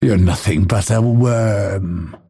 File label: cast pain; dota; phantom assassin